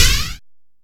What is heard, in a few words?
Snare, dry snares; Quasimidi Rave-o-lution 309